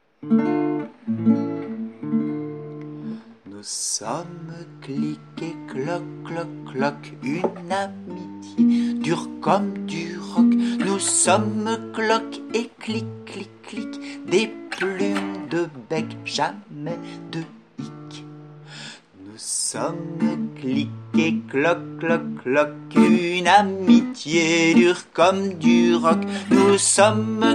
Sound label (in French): chanson; clic et cloc